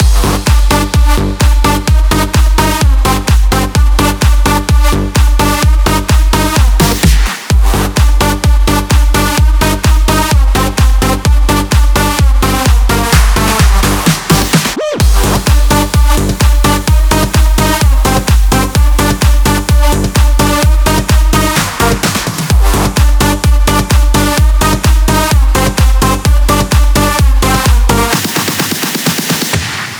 128BPM Mix, Root G; Early Morning

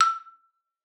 E5, xylophone; Xsample VOL-4

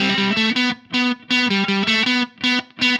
Happy Pub Guitar Riff, Indie Rock; Sonic Foundry (Sony Creative Software); Indie Rock Anthems; Session 05 - Happy Pub

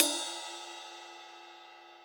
ride, Millenium MPS-400

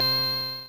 synth, amiga med

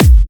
Electro Kicks

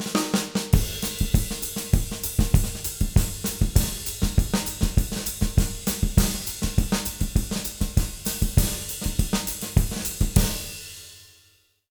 200 SAMBA, Jazz Latin Drumloops; USB Soundscan vol.08; AKAI

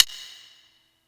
G# Sticks, Yamaha PSR E305

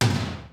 HOUSE KIT, DRUMS; Kid Nepro; Elite

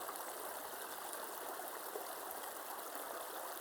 Waterway G Light

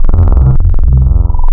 VIRUS SINE, wavetables

